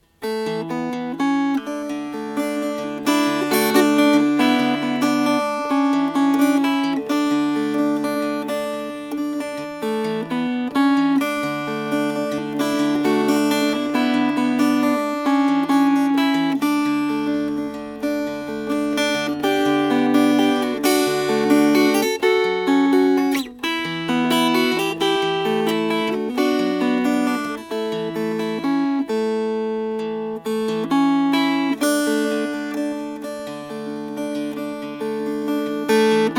Fingerpicked

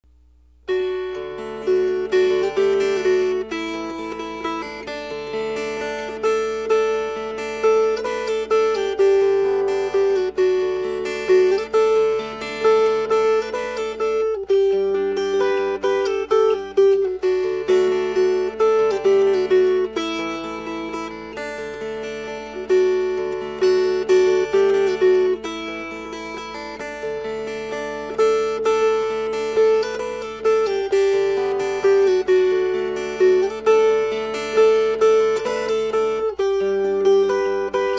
Fingerpicked

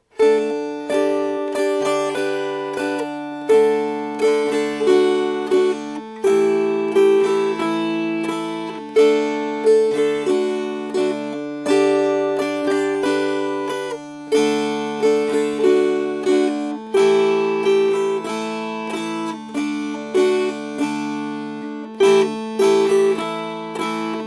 Strummed; Cumberland Mountain Deer Chase

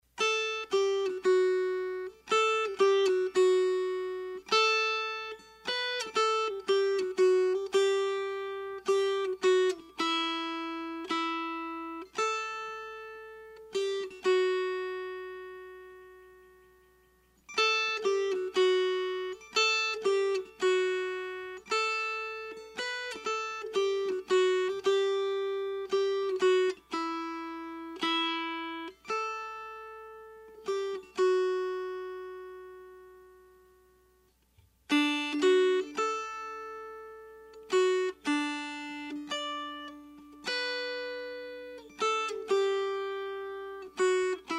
Melody

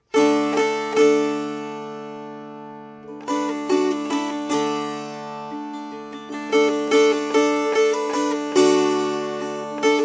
Strummed

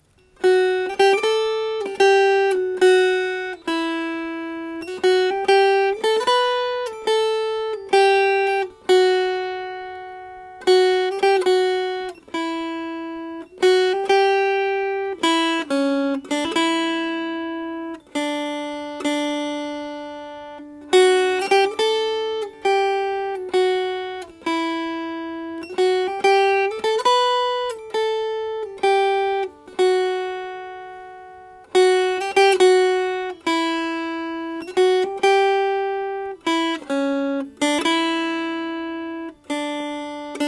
Estonian Waltz Melody

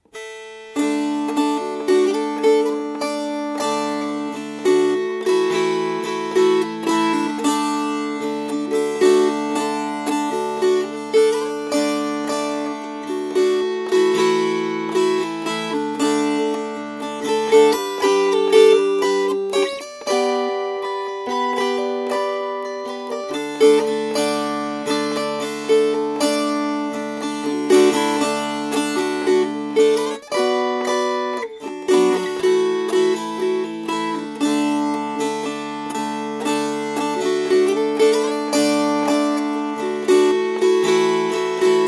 Strummed

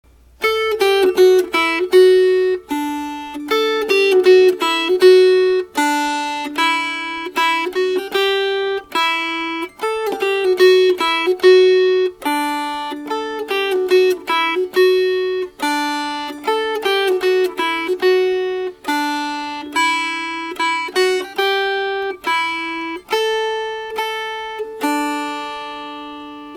Suomi Melody

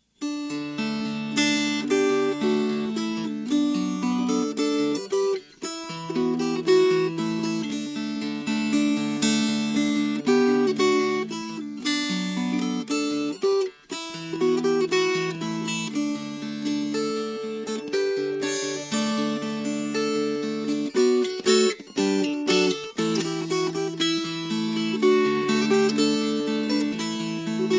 Fingerpicked; Ma'oz Tzur

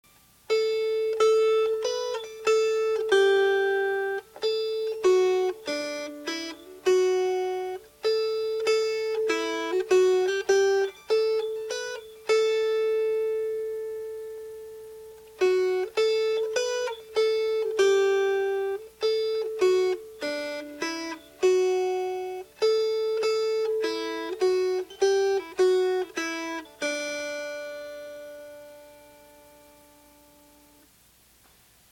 Melody

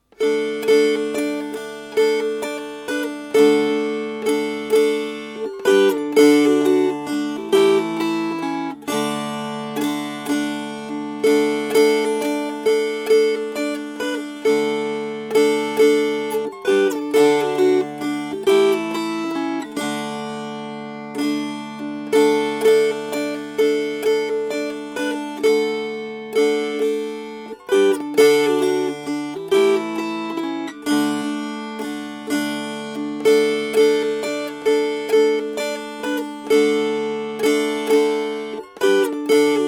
Strummed

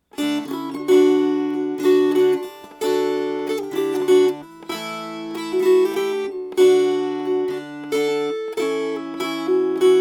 Strummed; Just As I Am

